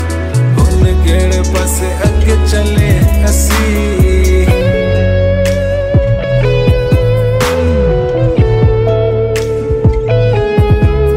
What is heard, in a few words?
hindi music ringtone, bollywood ringtones